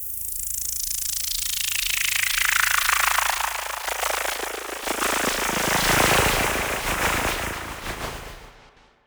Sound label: qweep, zaps and beeps